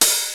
TR, drums perc, Roland; Rubber Chicken Software Sample Bank

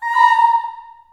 A#4 CHILD OHS, Choir

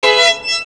VIOL, Gipsy; Best Service; Ethnic Journey Sound Cube

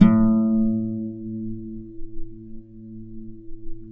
A1 HARP; USB Soundscan vol.62; AKAI

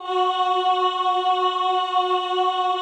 CHO, Roland; Sound & Vision - Gigapack I CD 2 (Roland); Mix-Combi 1

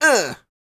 A YELL, MALE HUMAN; R&B & Dance; ILIO; Vocal Planet

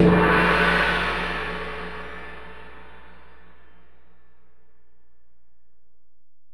Ride, Alesis DM-PRO, Drum Machines

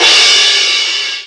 CYMBALS, Disco House; USB Soundscan vol.20 - Fresh Disco House I

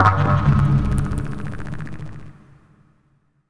SPACE-FX

